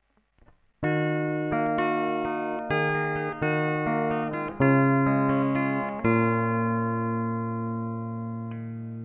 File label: clean, guitars